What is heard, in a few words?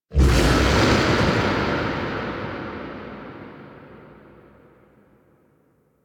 Growl; Voruna; Warframe; Vitruvian Museum; Warframe Powers; Leverian